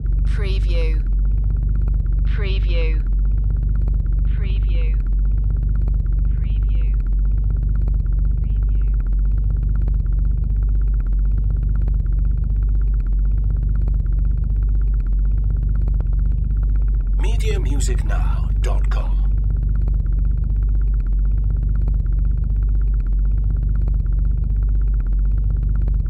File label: Alien Bass